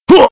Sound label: Jump; VCI Blueman